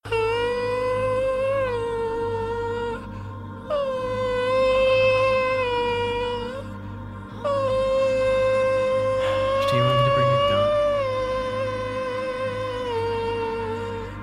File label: ahhhhhhhhhh louder